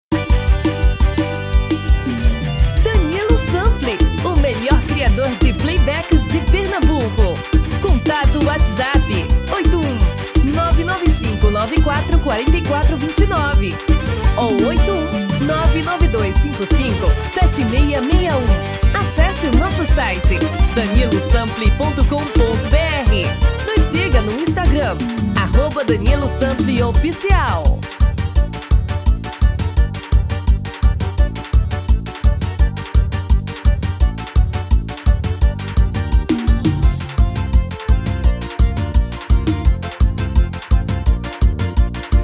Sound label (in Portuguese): Arrasta pe; CONTÉM 5 MÚSICAS SEQUENCIADAS DENTRO DO POUT POURRI COM DURAÇÃO DE 7 MINUTOS